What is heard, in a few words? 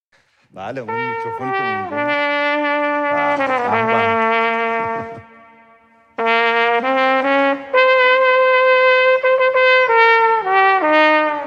Trumpet